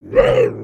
Attack; Skullkin; creatures organic

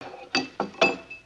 Chest Idle

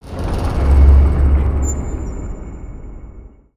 distant objects rattling; ninjago

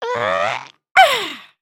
dance; emotes

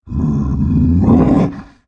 atk idle, creatures organic

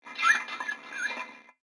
Pet Dog Robotic Idle; creatures; pets; Lego

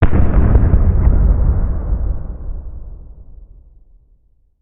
Monastery Thud; ninjago

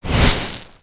Spinjitsu Fire One shot

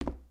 Foot Wood Boot Walk, footsteps

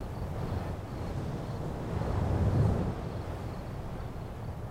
light ocean

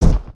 Pet Dragon Step Sweetener; creatures pets

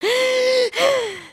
panic, emotes

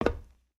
Foot Steel Boot Walk, footsteps

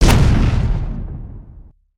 charge

